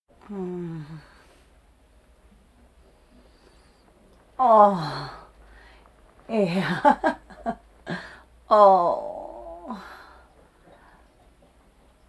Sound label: Vocal effects breathing Moaning Baby